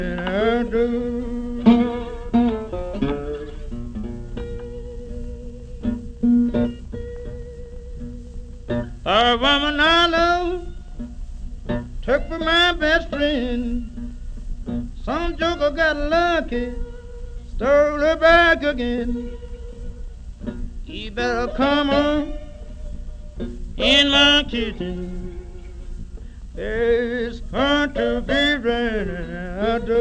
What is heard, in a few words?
blues